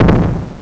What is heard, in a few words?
TANK; MOTHER